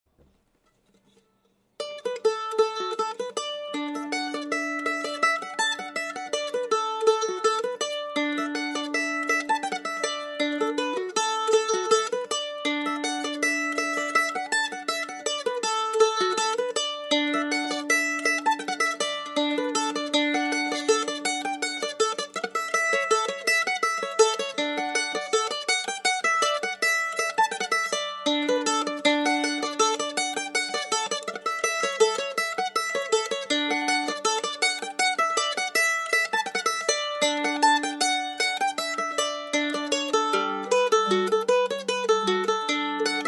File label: fowleys mazurka, irish mandolin; Listen to Jill playing Fowley's Mazurka here